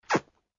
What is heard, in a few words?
Suck; Lewd SFX